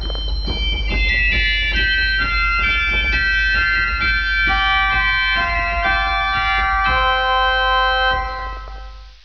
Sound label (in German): Zimbel 2f; Vitus